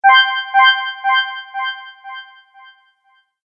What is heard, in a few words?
Fantasy Magic Accent; Magic Sound Effects